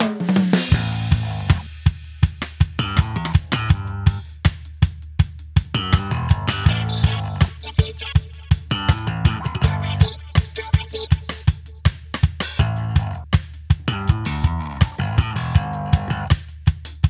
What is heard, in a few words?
Shooting Range